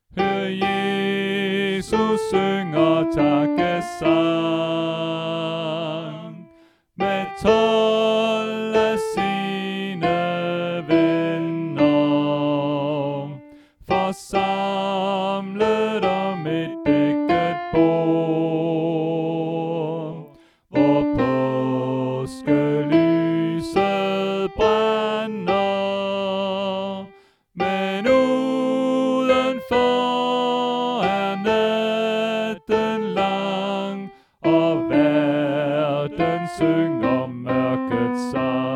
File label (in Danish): Sopran; oevefiler